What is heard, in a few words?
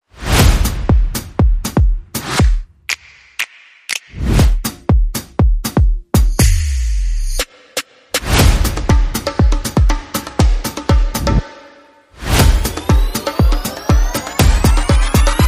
Showtime Shuffle, Percussion and Effects